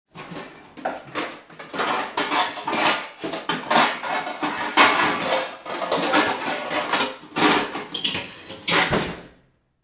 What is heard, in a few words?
kitchen; fnaf; fnaf1